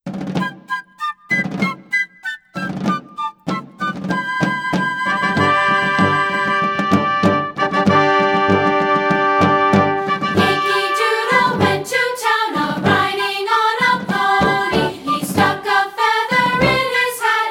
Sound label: Yankee Doodle w voices